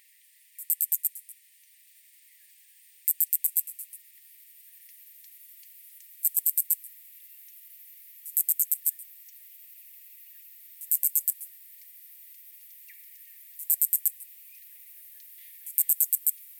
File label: melaleuca cicada